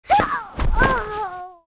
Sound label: Magi F dead